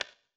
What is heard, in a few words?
surface wood tray, dice; darkwatch